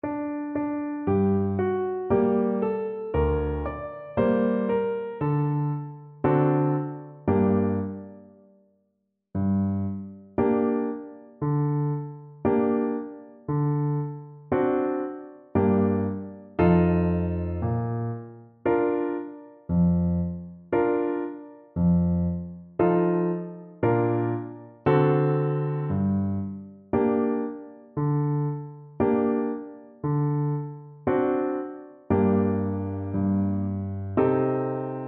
Tuba

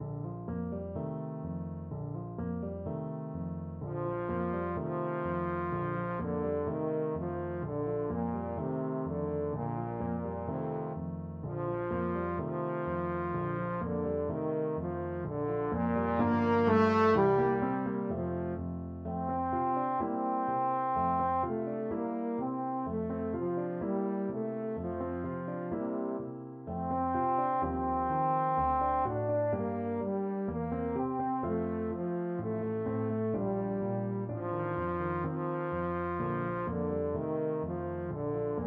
trombone

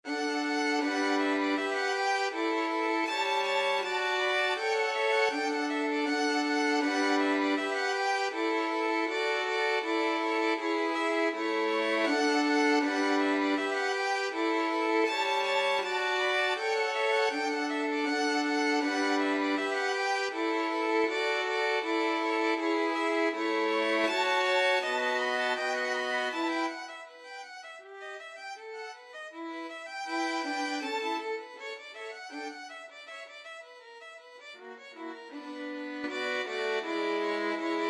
Bach Gavotte Cello Suite violin trio

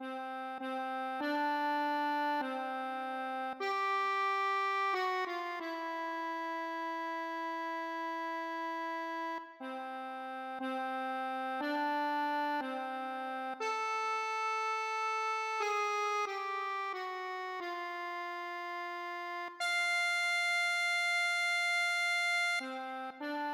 happy jazzy birthday, accordion

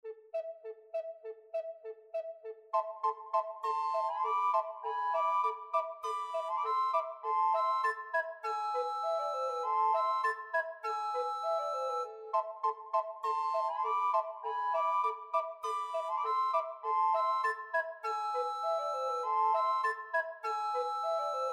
3 recorder

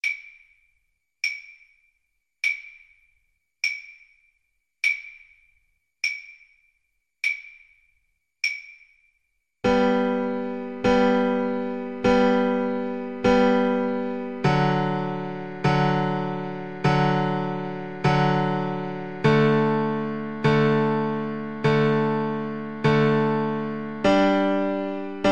vivaldi winter, Guitar

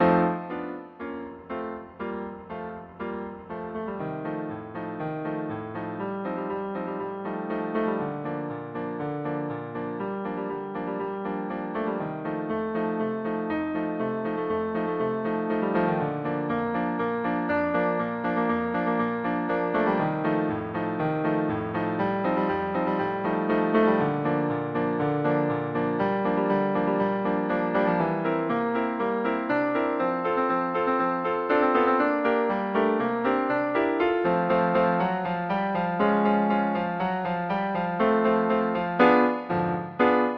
euphonium

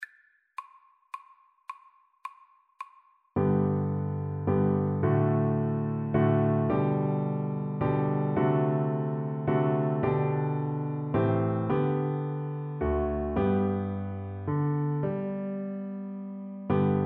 drink to me, Viola

